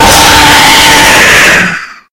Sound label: jumpscare; one night at springtrap 4